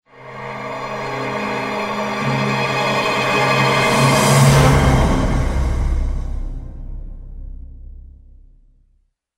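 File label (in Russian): Звук саспенс; Звук саспенса, так же как и жанр саспенс в кино создает невыносимое, напряженное ощущение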